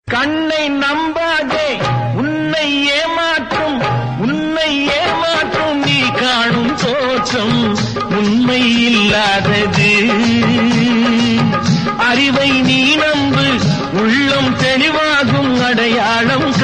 song

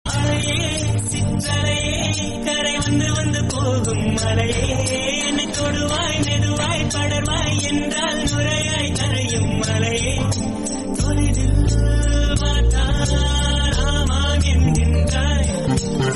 Tamil; alai payuthey

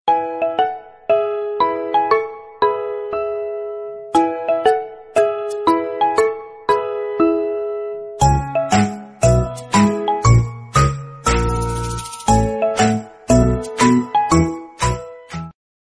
malayalam flute background music

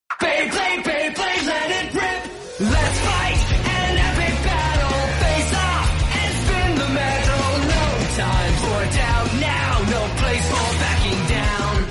hindi theme song; beyblade metal fusion

